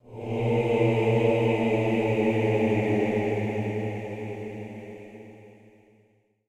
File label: amb hymns eerie; Blackfaun